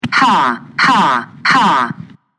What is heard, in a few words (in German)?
Hahaha